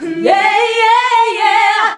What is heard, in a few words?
VOX, Singin